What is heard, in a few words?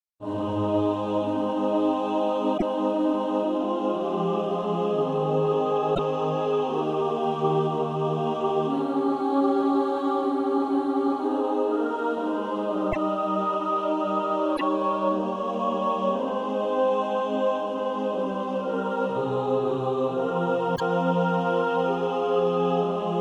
mixed, Practice Music